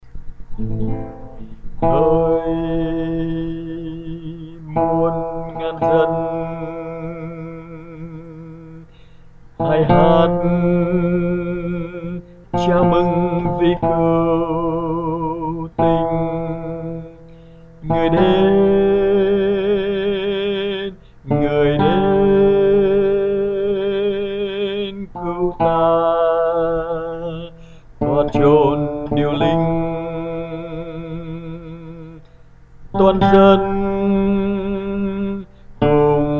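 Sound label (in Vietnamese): Chúa Ra Đời, Alto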